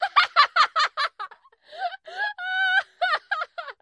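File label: Laughter Female Shrill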